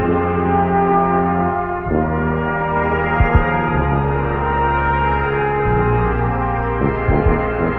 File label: horns; Sad